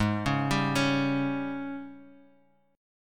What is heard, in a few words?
Ab Major 7th Suspended 4th Ab down Guitar Open F; AbM7sus4 arpeggiated